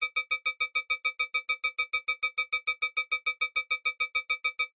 science fiction alarm fast high pitched warning tone emergency